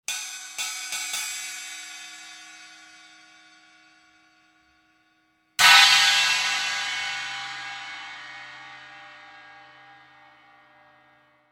Sabian

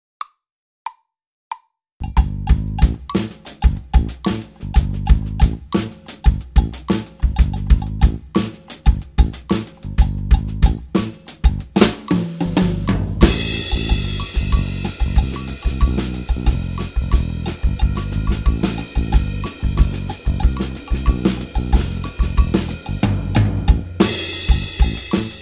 Partido Samba, latin

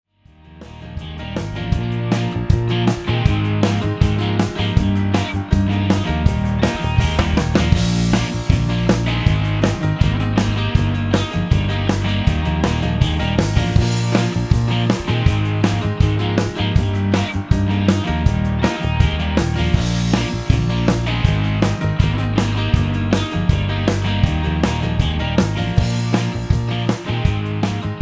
black beauty im kontext, snares; ludwig black beauty

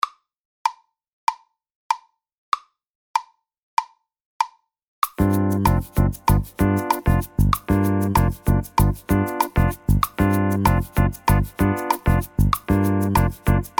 Partido, latin

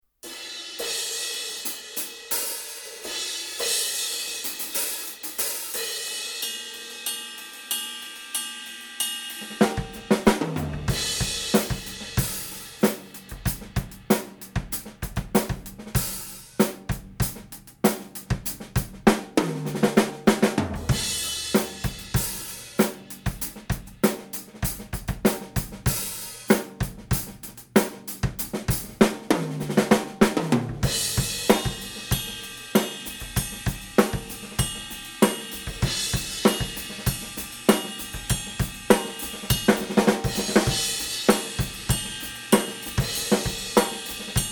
Set, cym; (In dem Set ist noch ein Masterworks Crash aus der Custom Serie zu hören. es klingt etwas höher als das Troy.)

